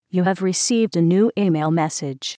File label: Received new email